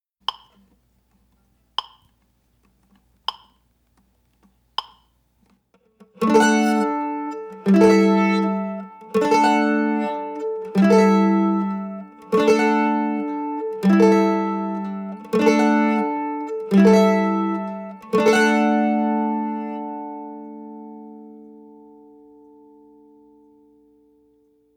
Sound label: Simple Strum with Chords